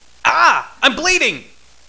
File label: wound